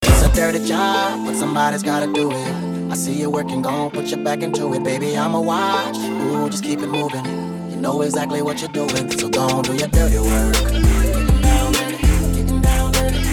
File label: funk; multitrax